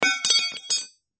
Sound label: Metal Rod Drop, Foley Metal Rod Drop Pipe; Metal Sound Effects; Materials; Blastwave